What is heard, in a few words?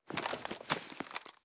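foley assault rifle akilo47 reload empty twist; weapons; viper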